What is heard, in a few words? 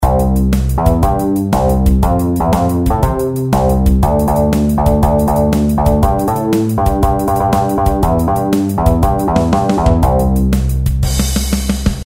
durm patern